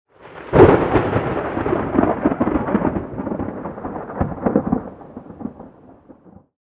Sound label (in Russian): rezkij udar groma; звук грома; одиночные удары